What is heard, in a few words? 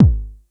Kick One Shot, Drums